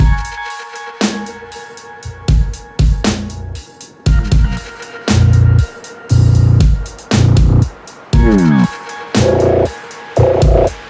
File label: Bass Monster